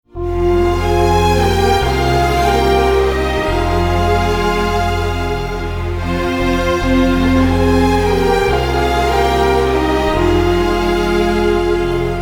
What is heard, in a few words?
Instrumental